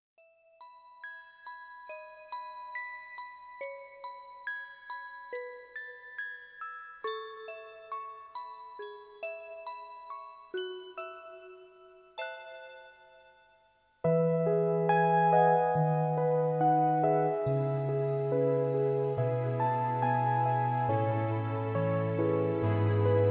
Mp3 Backing Tracks

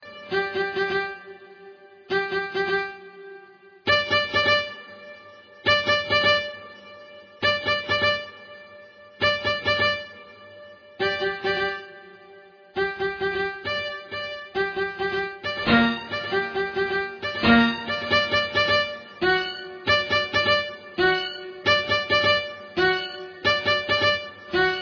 String Jig

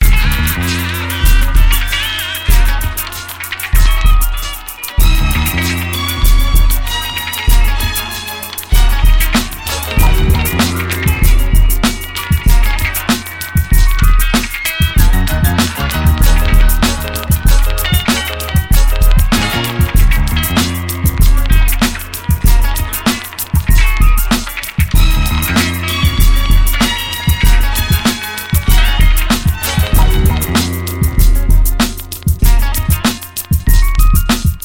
beat

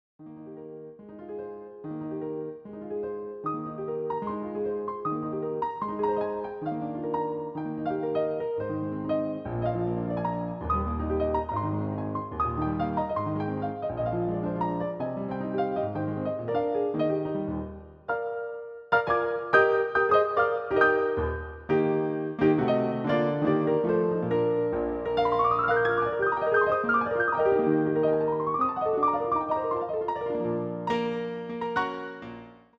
L Ondine Piano Roll; Op 101 No 6; Played by Cecile Chaminade

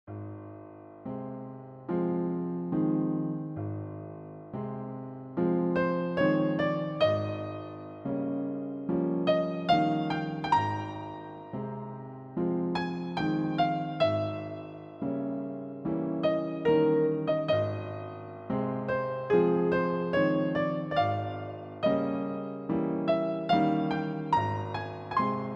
Piano Concerto; Opus 7; 2nd Movement; Schumann Clara

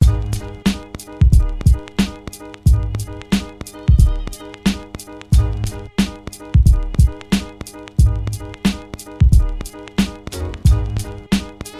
beat

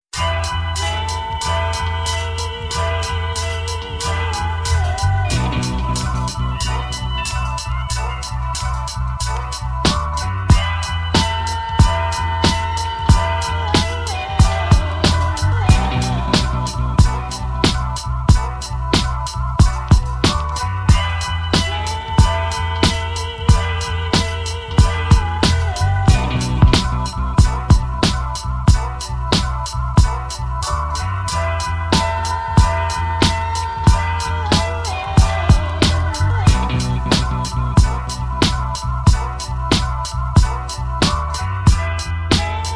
beat

